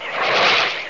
Bazooka; worms dc; tykes

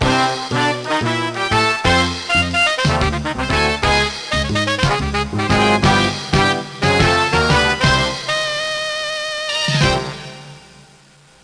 Music Hit

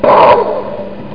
explod

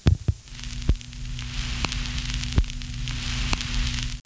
Needle-Record Scratch

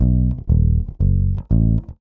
bass loop, Rock; ACID MUSIC

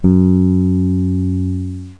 f#, bass; Virtual Graceland

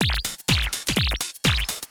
DRUMLOOP HOUSE 125; eJay

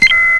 Dog Plane; Falcon 40

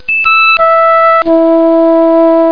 FLUTE; Amiga Format CD

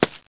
DEBRIS; flying

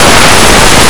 book; Blue Heat

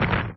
land; Špidla: Hráčůj ráj 2; cubed

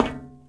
metal; Shadow Man

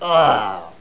die

